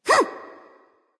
grunt jump; smite; anhur

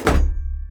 clap; Smite; god Khepri

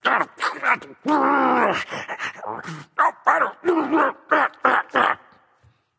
grumble; smite; voicepack; poseidon

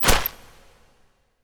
pounce impact; smite; Arachne

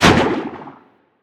fire; smite; scylla

